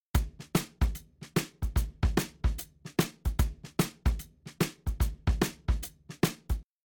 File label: Drums, shuffle drums; WDR2